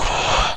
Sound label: pickup; nzp